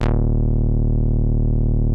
TAURUS E2, synthesizer; Hollywood Edge - Giorgio Moroder Rare Synthesizer Collection

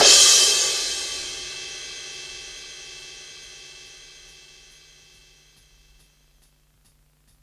Grunge Live Crash, Drum Hits; Zero-G; Soundclash

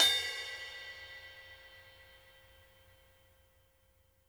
BELL, Drumworks; Sampleheads; New York City Drumworks VOL-1

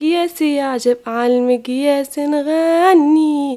GIRL, GIRLVOICE, Arabian Traditions

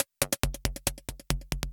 percussion loops, Techno; PS-45 WAV Techno ID

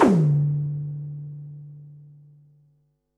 snaredrum, Pearl SC-40

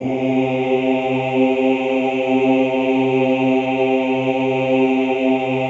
OOH C2, Classical Choir; Best Service; Extended Classical Choir; OOH MIX

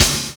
DOUBLE BD; Univers Sons

